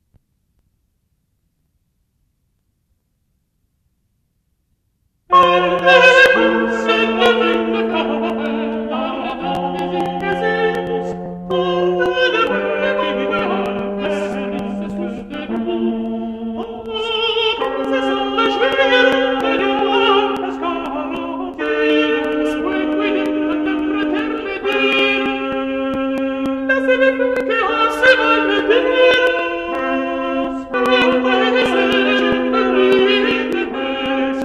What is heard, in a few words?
Motet; Machaut